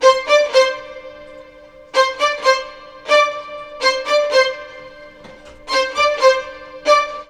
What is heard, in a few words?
STRG, Roots Disco; USB Soundscan vol.32; AKAI